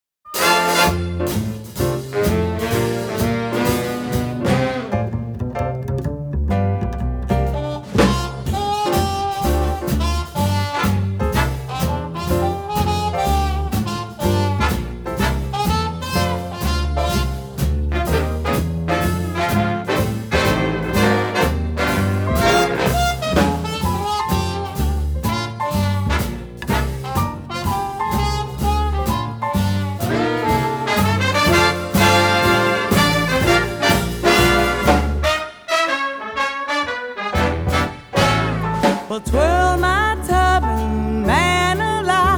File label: Brass Reed